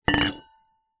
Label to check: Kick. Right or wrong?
Wrong